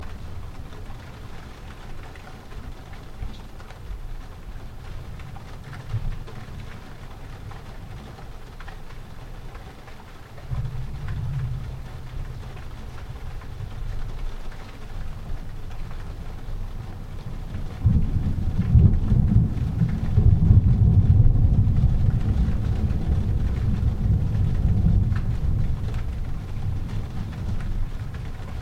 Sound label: Thunder